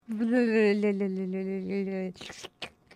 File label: slurp